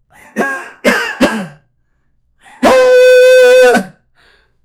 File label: coughing and moaning